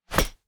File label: Arrow Release Shot Bow And Arrow